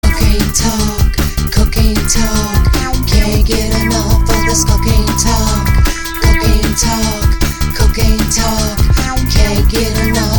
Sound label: win, music